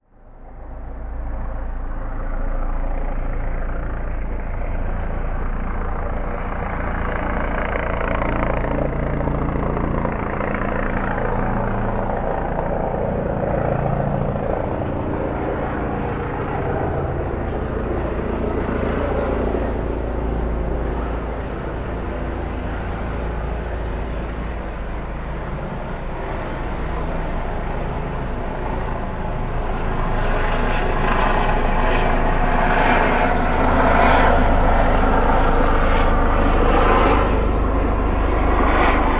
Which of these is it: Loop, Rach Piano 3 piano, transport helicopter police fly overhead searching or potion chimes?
transport helicopter police fly overhead searching